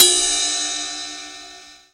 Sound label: Long Ride, Mixed Percussion; Zero-G Jungle Warfare 1, 2 & 3; Percussion Hits